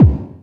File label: bass drum, Drum & Bass